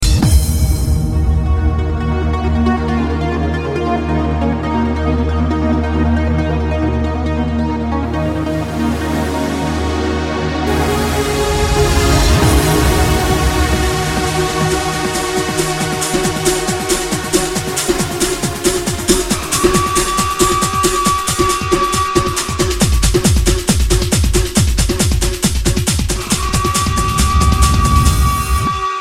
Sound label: Electro Mix